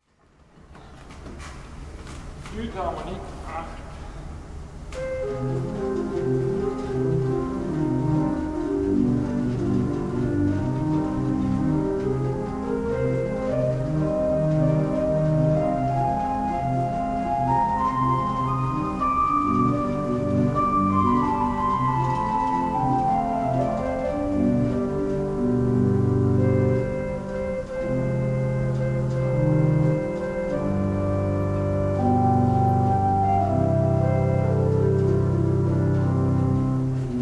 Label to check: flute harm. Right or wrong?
Right